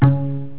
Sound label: pluck